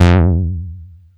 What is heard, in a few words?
Bass Juno F; Vengeance Samples